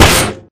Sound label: bullet 40mm titan pierce; Titanfall 2